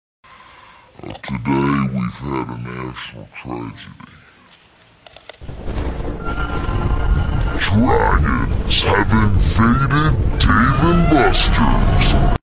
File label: dnb